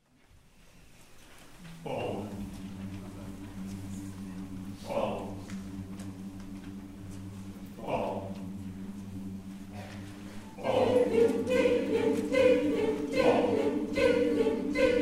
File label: bells rang